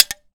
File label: Grenade Bounce; zps; Weapons; Explosives